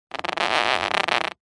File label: leather or similar squeak creak med; zapsplat